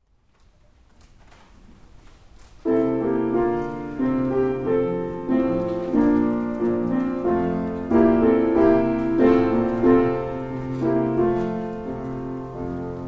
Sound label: chorus piano